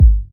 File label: dry bass drum single shot G sharp key, kick sample tuned to the G# note